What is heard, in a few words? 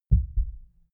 heart beat double quiet; comedy-cartoon; koerpergeraeusche